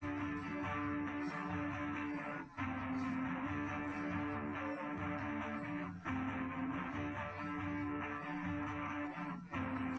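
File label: with drums, live rec; SiSEC11; underdetermined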